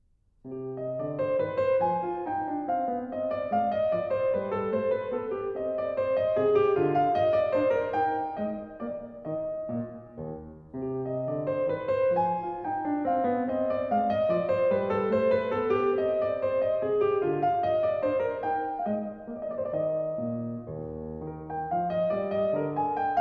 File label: Menuet, C Minor, klavier; French Suite #2; BWV 813; BACH